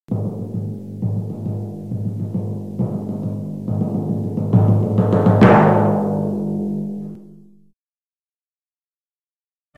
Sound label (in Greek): Τύμπανο